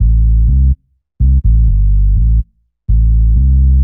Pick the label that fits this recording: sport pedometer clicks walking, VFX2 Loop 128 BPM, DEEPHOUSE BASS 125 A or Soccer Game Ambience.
DEEPHOUSE BASS 125 A